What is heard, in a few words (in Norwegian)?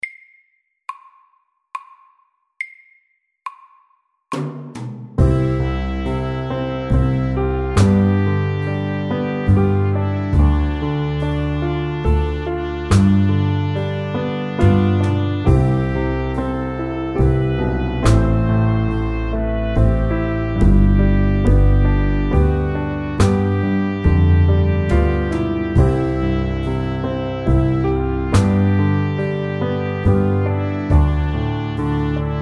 Soft rock SAKTE